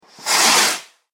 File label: Fireworks Large Single Whoosh